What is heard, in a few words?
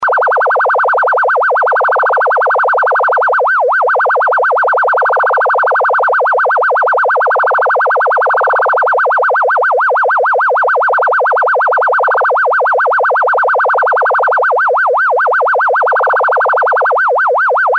Random Sweep Rate